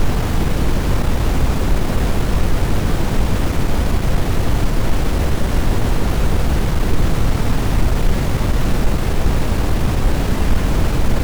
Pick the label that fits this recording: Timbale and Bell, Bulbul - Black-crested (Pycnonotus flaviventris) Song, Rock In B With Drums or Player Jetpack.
Player Jetpack